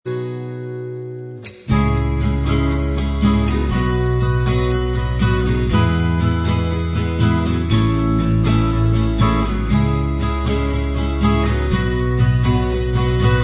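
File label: acoustic, instrumental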